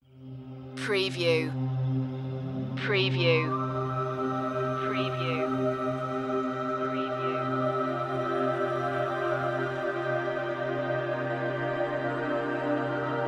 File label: underwater space